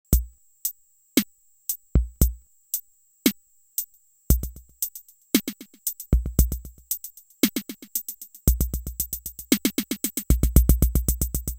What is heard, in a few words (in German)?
Drums mit Effekten Delay